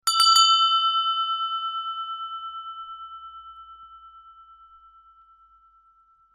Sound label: tibetan bell